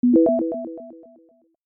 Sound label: message alert